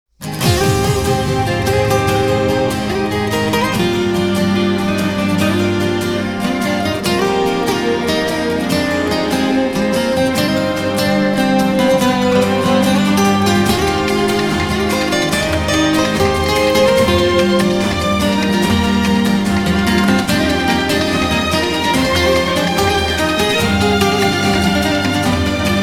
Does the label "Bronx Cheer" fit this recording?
No